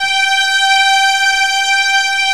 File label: VIOL G4, VIOLINS